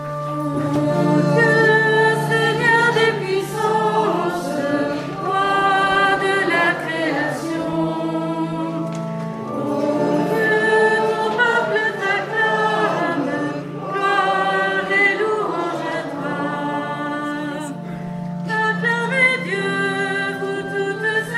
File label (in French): chant entree